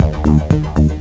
bar, Music; Swoop

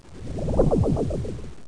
Plus sound; RAYDREAM95

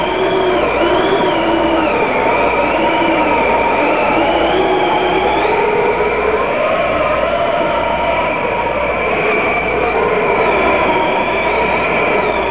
Blizzard; Museum of Anything Goes

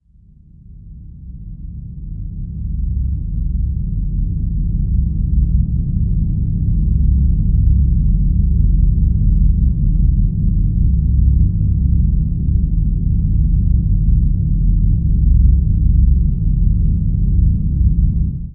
BIG SHIP; EARTH

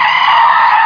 skid

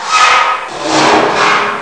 metal scrape

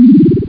Alien Beam; CephaloTron

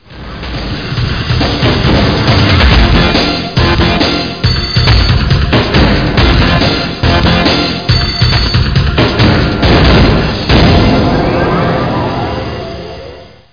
Sound Drum Beat; The Amazing Spectacular Ordinary Bottle

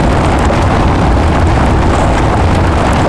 GRAVEL, SURFACES; ImmersionRacing

